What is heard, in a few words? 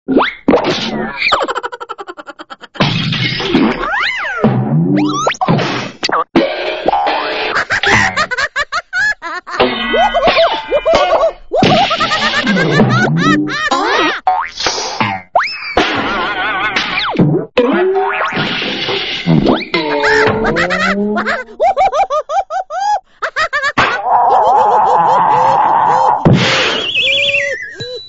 tv cartoon; SA2B Music